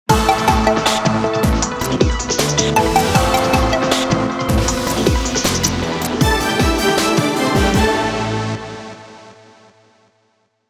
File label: synthetic news